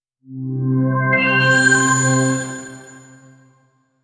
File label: rising futuristic mus, drone